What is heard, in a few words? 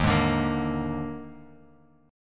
D sharp Major 7th Suspended 4th, D sharp, down, Bass Standard; strummed; D#M7sus4; JGuitar